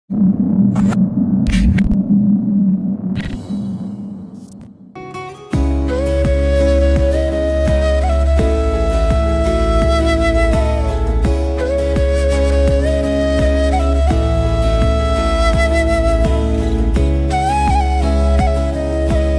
instrument ringtone tamil song instrument ringtones